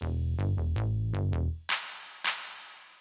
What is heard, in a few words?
Washing Machine Quest Outro; Plants vs. Zombies Battle for Neighborville